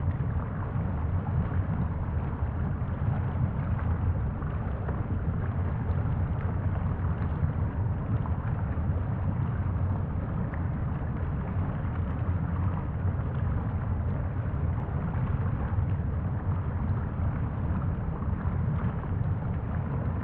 atmos lava far; Hollow Knight: Silksong

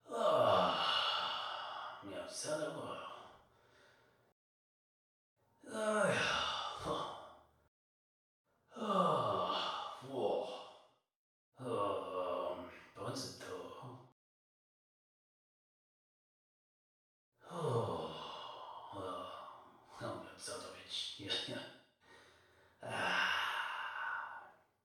sauna idle loop

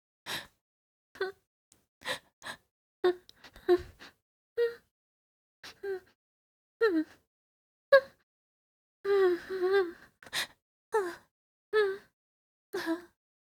scared loop; Hollow Knight: Silksong (gamerip) (2025)

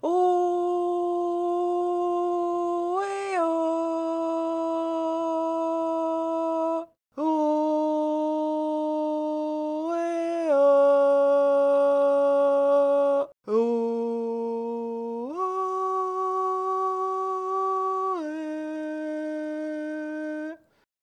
sing

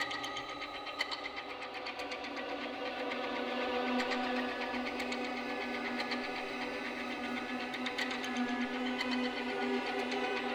Creepy Ambient

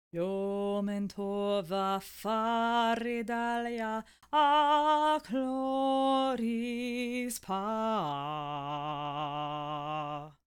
Phantom Sing; Hollow Knight: Silksong

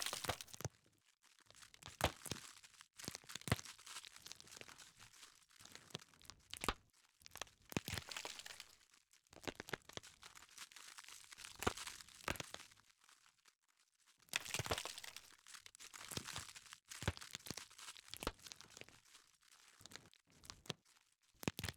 ui frostbite loop; Hollow Knight: Silksong

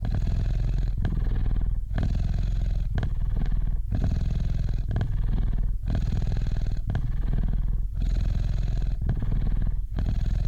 bellbeast snore loop; Hollow Knight: Silksong

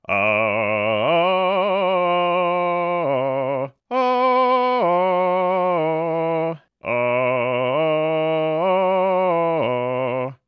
sing type; Garmong

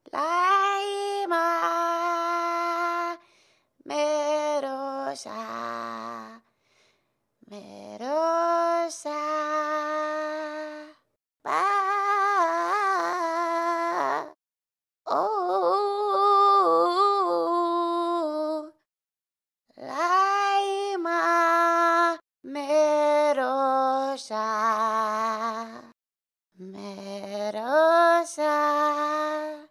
sing

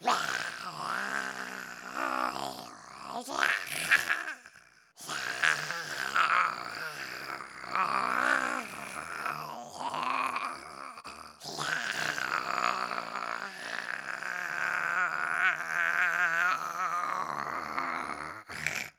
Spider sing

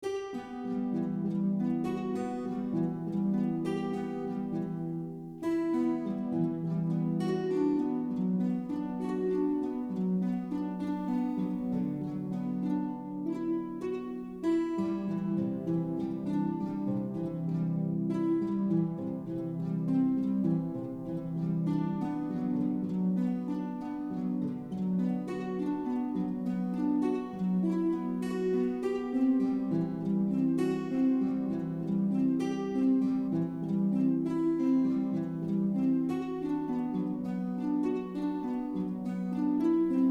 harps wip; Hollow Knight: Silksong; widow

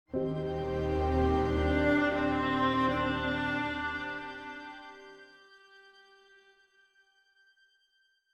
start sting

